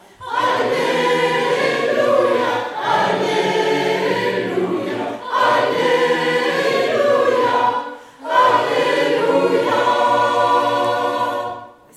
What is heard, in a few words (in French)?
Alleluia Christ est ressuscite Ref Tutti